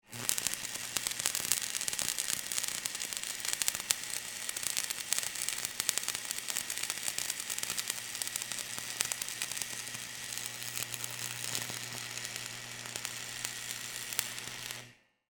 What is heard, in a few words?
Wire Welder Spark, Industry, Wire, Welder, Spark; Machines; BlastWave